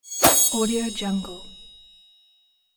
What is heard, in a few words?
accept